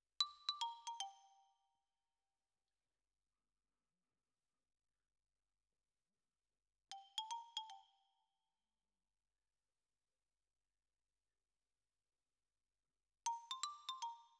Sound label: Xylo Close